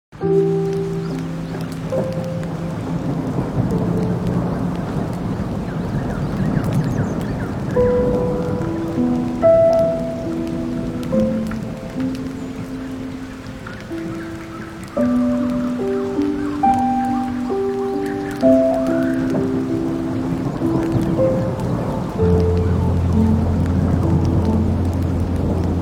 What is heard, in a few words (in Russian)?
Zvuki Lesa; Zvuki Dlia Sna